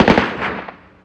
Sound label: gun ak47 med; amb elements; modern warfare 2